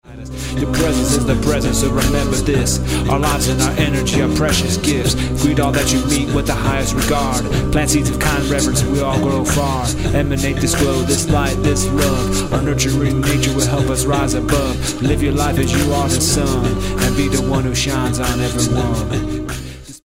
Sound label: haunting